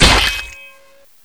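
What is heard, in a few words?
Damage Range Plate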